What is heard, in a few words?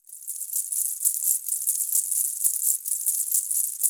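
afro necklace, Tanzanian bell; Spectrasonics; Heart of Africa 1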